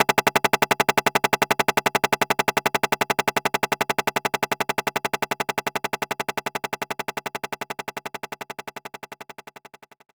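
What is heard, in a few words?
Guitar Cut Down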